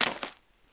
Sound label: flesh; ambient; Shadowman